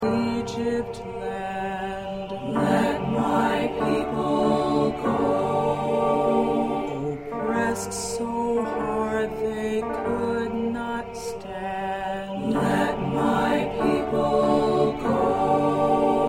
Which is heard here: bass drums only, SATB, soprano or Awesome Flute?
SATB